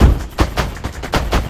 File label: LOOP, 160 BPM, Drum & Bass